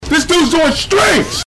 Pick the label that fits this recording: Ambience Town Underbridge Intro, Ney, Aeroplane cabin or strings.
strings